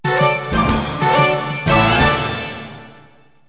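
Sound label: short circuit, music; Wired for Sound Pro CD; Aristosoft, Inc.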